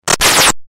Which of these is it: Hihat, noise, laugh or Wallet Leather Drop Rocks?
noise